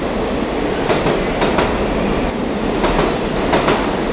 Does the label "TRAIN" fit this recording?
Yes